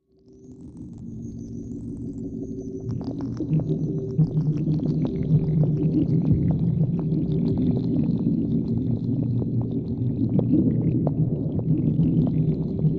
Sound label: Spinal Fluid Extractor, Machine, Fluid Movement, Low Gurgle, Space Drone, Atmosphere